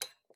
Cup Drop; Game rip; MiSide

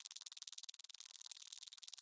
Cockroach; Game rip; MiSide

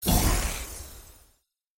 Healer Invoke; Game rip; MiSide

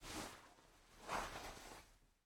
Mita Take Key; Game rip; MiSide

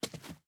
Step Marble; Game rip; MiSide

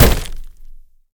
Door Close Meat; MiSide

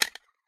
Sugar Cap Drop; MiSide

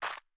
Jump; MiSide

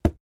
Book Hit; MiSide

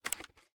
Console; raw game audio files; Mita; MiSide

